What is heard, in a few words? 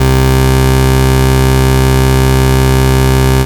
Bass Note C